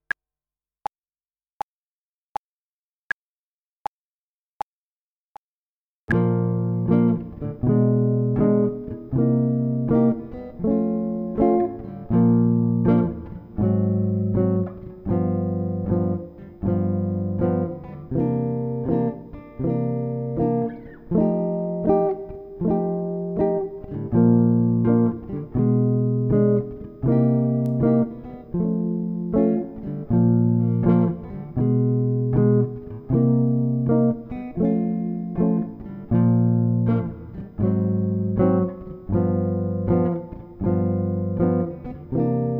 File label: Clouds playback Grille tempo 80